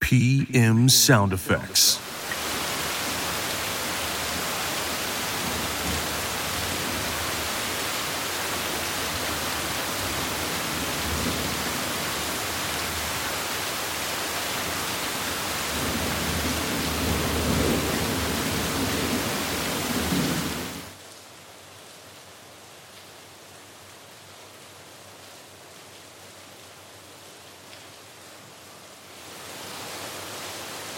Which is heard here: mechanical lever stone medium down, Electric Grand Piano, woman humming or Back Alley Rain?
Back Alley Rain